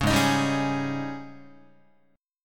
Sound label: Ab Major 7th Suspended 4th Ab down Guitar 4A 4E 5A 5D 5G sharp 6C sharp; AbM7sus4 strummed